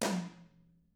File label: TAMA, Drums